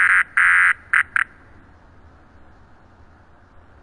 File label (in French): insecte biz